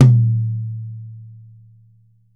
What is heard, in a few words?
YAM 8X12, drums; Big Fish Audio; Ross Garfield Drums VOL-2